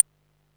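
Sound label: MISC Left, Techno 138 BPM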